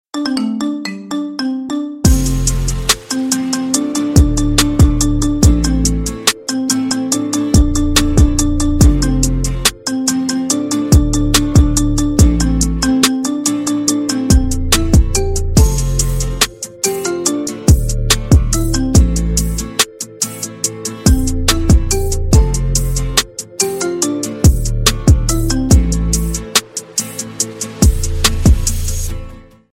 marimba remix

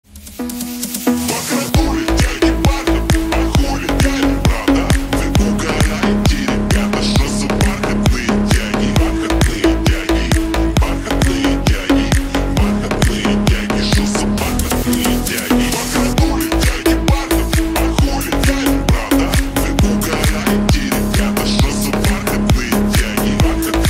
phonk house remix

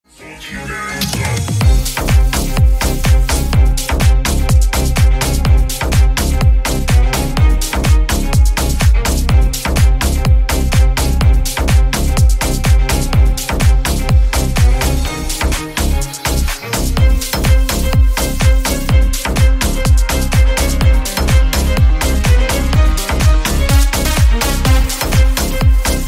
dance music

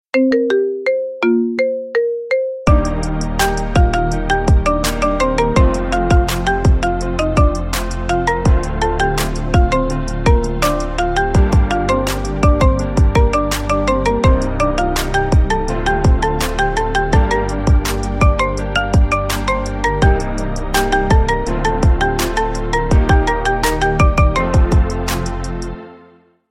marimba remix

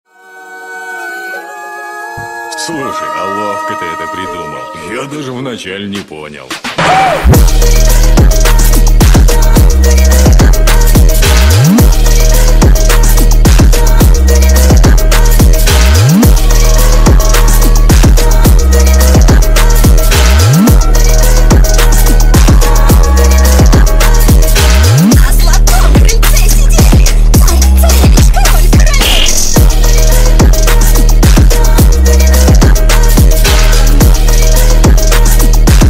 trap remix

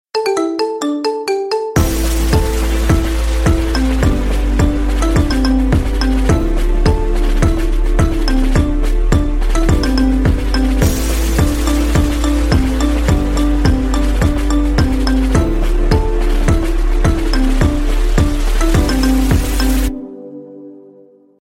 marimba remix

